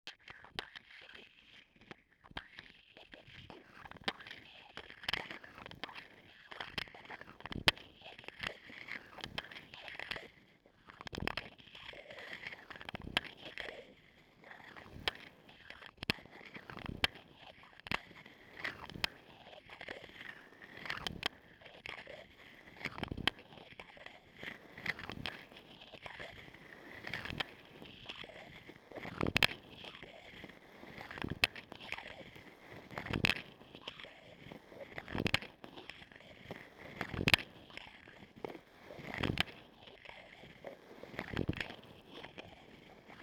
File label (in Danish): Knapper paa uldfrakke, meget rytmisk, contact sounds